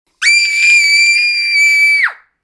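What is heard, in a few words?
girl squeal; devushki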